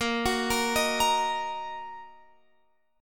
A sharp Suspended 4th Sharp 5th A sharp down Guitar Open F; arpeggiated